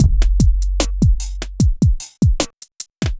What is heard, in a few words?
hiphop